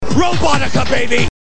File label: baby